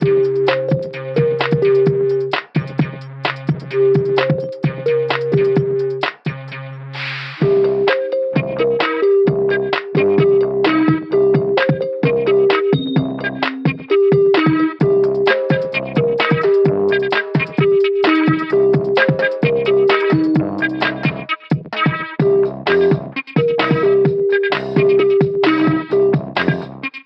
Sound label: BoomBox loop